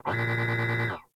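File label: Research Facility Repair Robot Lift; Warframe; Venus Open World; Objective Rooms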